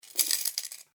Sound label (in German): Sparschwein